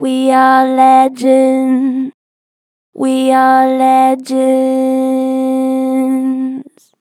Vib We are legends, Vocals, Natural Vibrato Strong; Harmor Vocals